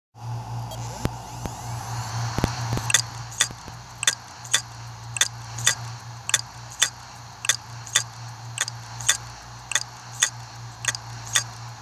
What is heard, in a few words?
Hitachi 320Gb hts543225l9a300